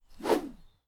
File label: Bamboo Swing